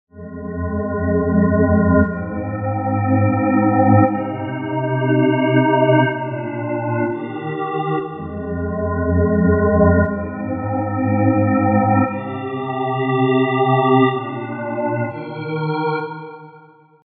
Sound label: dusk organ; cirrus